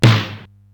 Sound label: SDS9 SN, Simmons SDS-9, Snares